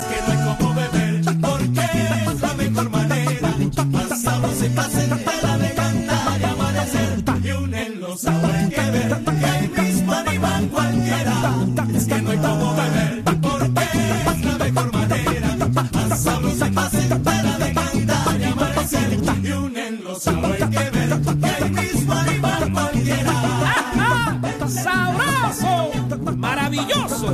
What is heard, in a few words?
vocal song - gaita